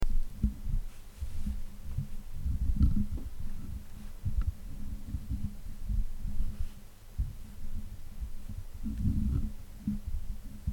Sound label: fixing mic stand